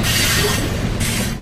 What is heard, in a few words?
stopping, train; Vehicles